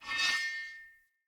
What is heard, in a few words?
Sphere Polearm Whoosh Accent D, Weapons Player